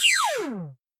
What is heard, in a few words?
phaser